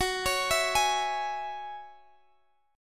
F sharp 7th Suspended 2nd

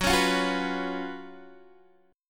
Gb Major 7th Suspended 4th, down, Guitar, DADGAD

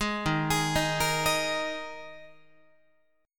D sharp Suspended 4th, Guitar; D#sus4 arpeggiated; JGuitar

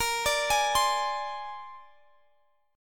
A sharp 9th A sharp Guitar Drop D

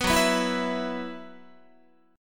F sharp 7th Suspended 4th, Guitar

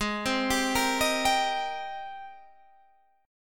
Ab Major 9th Ab down; AbM9; arpeggiated

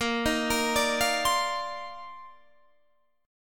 C Minor 11th A sharp

